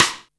Boss DR-660 Snare, Drum Machines

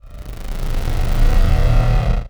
Basz, One Shots; Sonic Foundry (Sony Creative Software) - Future Retro Dance Excursions

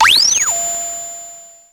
Drum, Electro-Harmonix Spacedrum

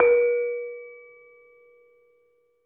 LAMELS A#3-R; USB Soundscan vol.60; AKAI; LAMELOFO

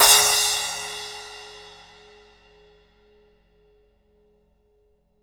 splash, Rod Kit; Akai MPC500

